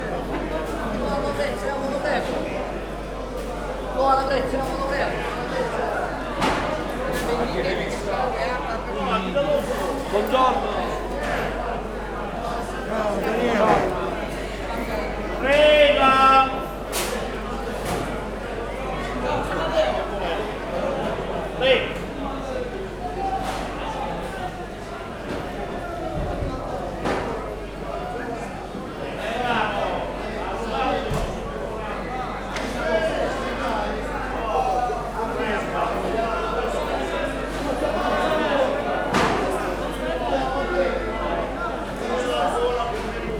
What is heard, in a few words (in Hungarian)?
piaci hangulat